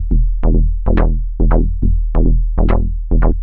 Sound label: Bass Loop 140bpm